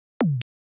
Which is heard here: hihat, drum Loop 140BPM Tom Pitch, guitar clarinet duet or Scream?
drum Loop 140BPM Tom Pitch